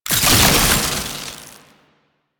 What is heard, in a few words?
Alchemy Grenade Pickup Electricity; Warframe; Entrati